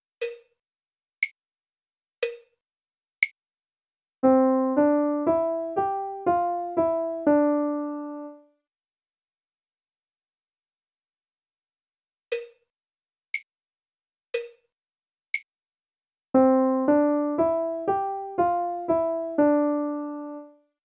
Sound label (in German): Melodie